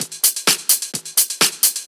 Clubhouse Loop 128 BPM

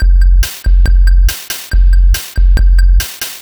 Cheepo Groove 140, Lounge Drumz

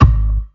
Bd Dirrty, Kick Drums; Zero-G; Soundclash

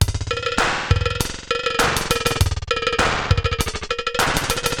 Digital Delay RX17 (100BPM), cyborg beats; musicradar

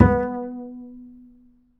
B 4, Bass; Spectrasonics; Bass Legends

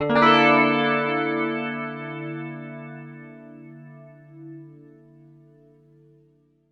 Guitar Chords D; Zero-G - Future Wave

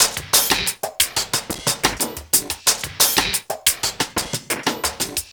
Percussion, R&B Drums

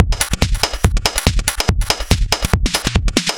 Beat Crush 142bpm, uk garage

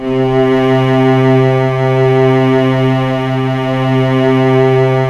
SAD CELLO, JV-1080 ORCHESTR; Syntec; Wall of Sounds VOL-2; ORCHESTR-EXP

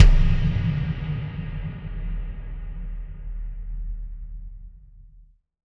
Kick Shard, Processed Drumkits

